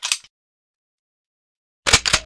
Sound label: shotgun reload